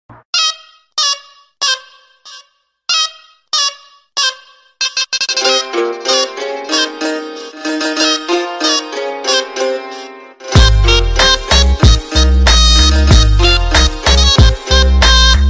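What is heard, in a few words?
tik tok, sonnerie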